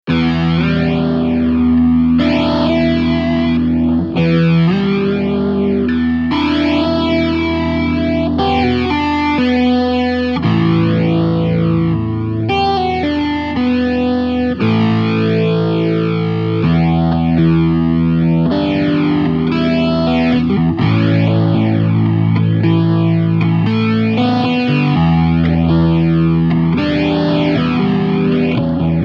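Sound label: Em 116 guitar riff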